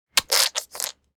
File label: Hair gel bottle squeeze